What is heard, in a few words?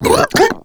female boomer disrupt vomit